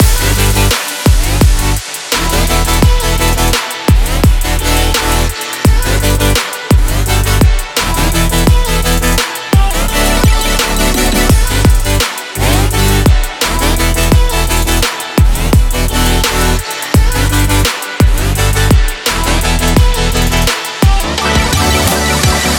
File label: a future bass